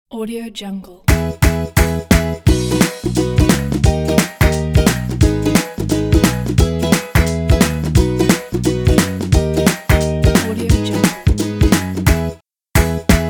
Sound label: happy pack